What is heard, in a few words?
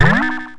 Rise